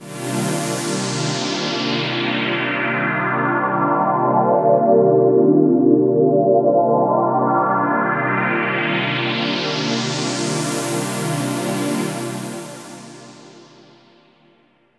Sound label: synth pad ambient 160 C; Sphere